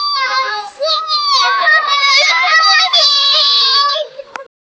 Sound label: cheerful bouncy music con, children